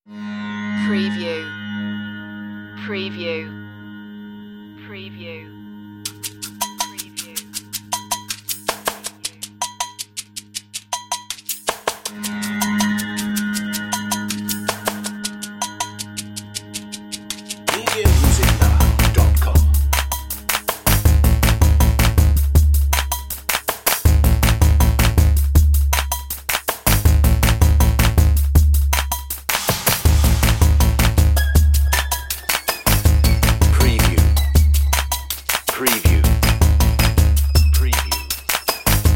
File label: eastern pulses no saz